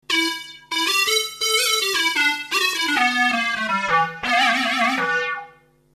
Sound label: Filt Q Lead